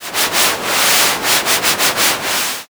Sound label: river